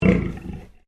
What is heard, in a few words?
Animal Pig Landrace Inside Grunt